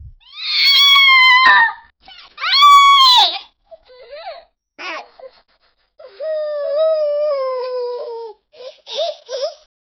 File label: child shouting and crying, distress